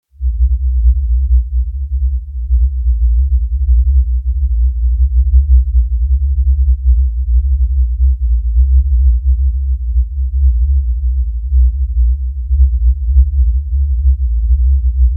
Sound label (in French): bruit rose